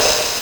LSDj Kit of Tom, Nintendo Gameboy; 300 Drum Machines

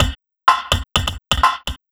Beats, Synchro Funk; Poppin; Sonic Foundry (Sony Creative Software)

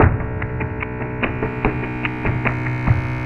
pod landing

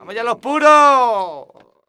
Flamenco, Vocals, Male

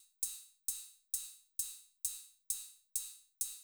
Beat, Old Skool House, 132bpm; Big Fish Audio; Marshmellow; Construction Kits

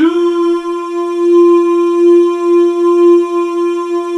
DOO F# 3, Synclavier Strings; ILIO

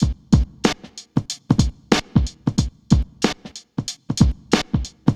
Drums, Urban Grooves; Sonic Foundry (Sony Creative Software); Stylus Pressure Urban Grooves on Digital Wax; Summer Jam

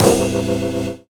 Organ Stab; Zero-G Jungle Warfare 1, 2 & 3; 90 s Sample CDs

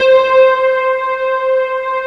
JAZZY C4

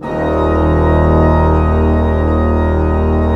PLENO D1, Cathedral Organ, PEDAL PLENO; Propeller Island

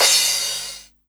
CYM, Dance Drums

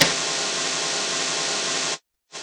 Yamaha CS6, Drums

